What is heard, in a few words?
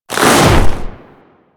Ice Swing; Warframe; Grineer Hammer Boss